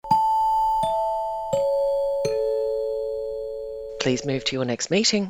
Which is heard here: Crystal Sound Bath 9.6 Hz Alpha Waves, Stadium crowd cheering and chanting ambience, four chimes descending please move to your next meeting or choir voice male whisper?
four chimes descending please move to your next meeting